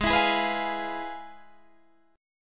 F Minor Major 7th Flat 5th, G sharp

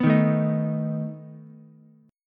A Suspended 2nd Flat 5th A down Ukulele; Asus2b5 strummed

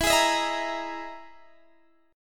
E Major 7th Flat 5th E down Guitar DADGAD

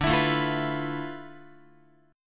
D Minor 6th Add 9th down Guitar; Dm6add9 strummed; JGuitar

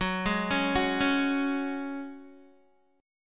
Gb Suspended 2nd, Guitar 4F,4A,5C,5G,5C,6E; Gbsus2 arpeggiated; 13,11,13,11,13,x

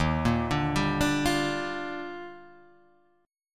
Eb Major 9th; arpeggiated; EbM9

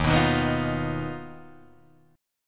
Eb Suspended 2nd Sharp 5th, Guitar, Drop D

